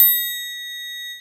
Yamaha EX5, Drums

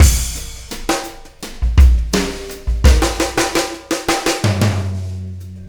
Fill, Loops; Sonic Foundry; Not Just Another Pretty Bass; AI Loops - Siggi Baldursson

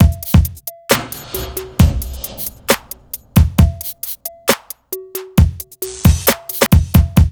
BEAT, Hip Hop R n B; USB Soundscan vol.19; AKAI

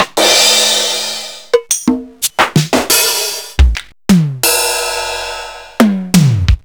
Linn Linn-9000

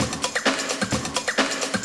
Dance Grooves

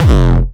909 Grime E1, Kicks; Mbase 11 Kicks

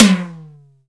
sfx perc

